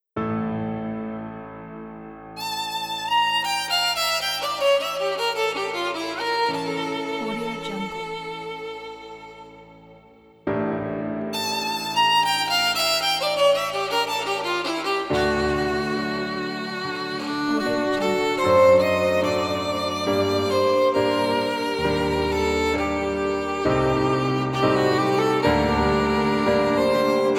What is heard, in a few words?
classical intro violin and piano